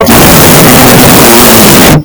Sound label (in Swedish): bult ljud